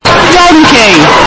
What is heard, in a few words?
donkey